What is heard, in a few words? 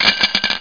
Rattl Clasp